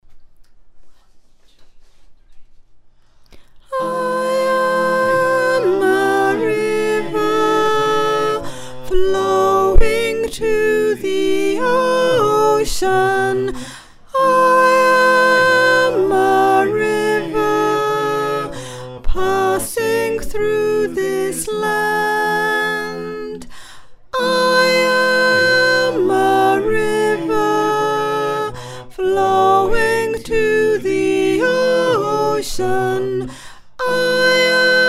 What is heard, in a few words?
soprano